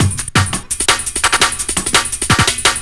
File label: Ricochet Break; Jungle; Breaks; Blu Mar Ten - Jungle Jungle - 1989 to 1999 Samplepack